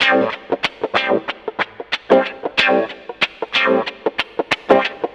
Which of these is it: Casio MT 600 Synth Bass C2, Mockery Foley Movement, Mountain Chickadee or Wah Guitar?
Wah Guitar